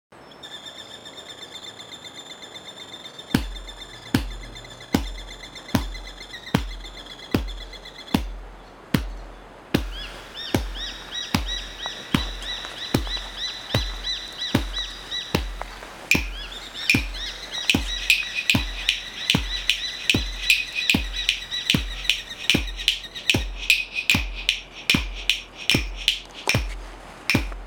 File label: Ambient soundscape